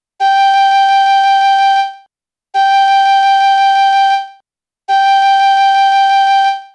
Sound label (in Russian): 2 oktava 5 sol flejta